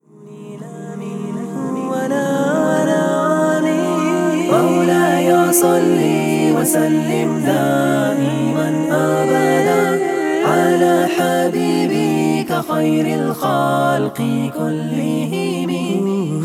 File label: Karaoke Version